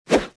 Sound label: swing, melee; weapons